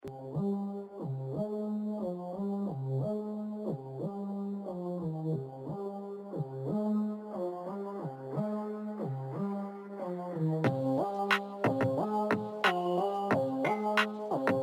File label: Syrup No Vocal